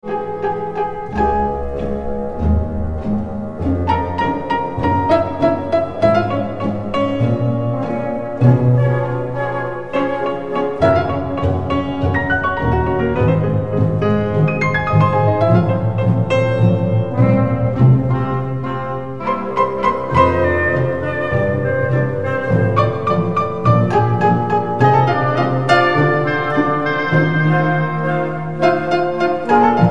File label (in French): concerto pour piano, adagio; GERSCHWIN (George)